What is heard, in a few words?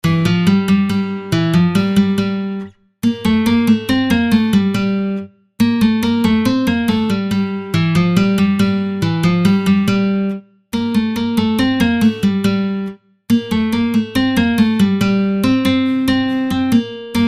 guitar